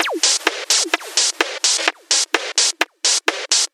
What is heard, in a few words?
House Loop, 128 BPM; Vengeance Samples